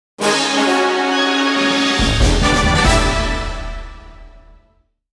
Crash bonus fanfare; castlevania best music collections box

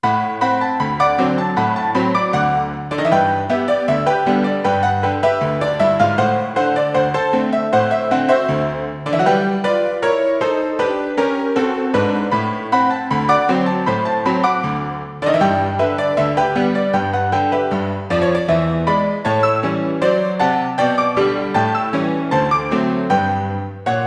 Player Piano; the colonels bequest 1989

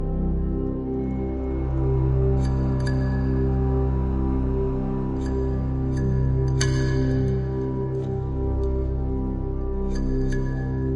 Kalimba; hiberworld android ios online windows gamerip 2019

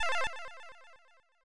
Press Start, SNES

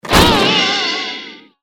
Wood Joint Break; bad piggies; android; mobile; windows

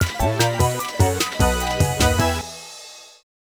Round Clear

